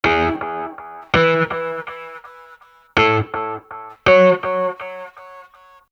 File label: fade gtr